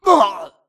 grunt death quick; Titanfall 2

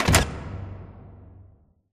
Giant Pillar Pods Stop; Titanfall 2; Boomtown

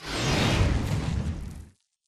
atlas dash slide; Titanfall 2

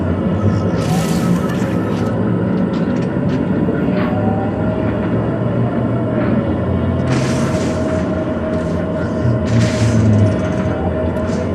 Robot Arm Track Fast Mid; Boomtown; Titanfall 2

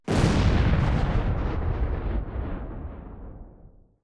weapon fire explo; Titanfall 2

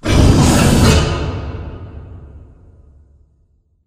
cooling panel lower; Titanfall 2

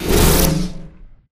cloak interrupt end; Titanfall 2

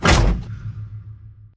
sliding door ext verb close start; Titanfall 2

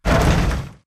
bullet explosive titan shudder; Titanfall 2

